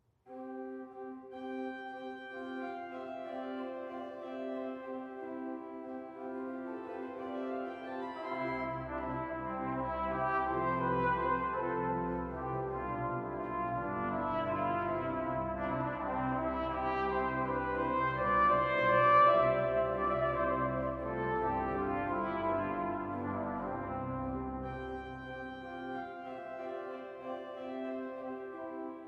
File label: suite